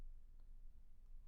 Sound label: Bass Room